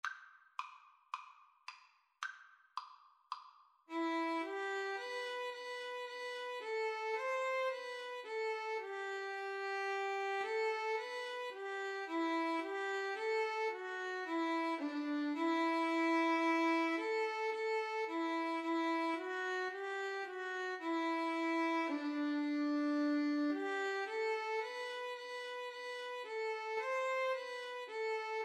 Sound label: Violin Viola Duet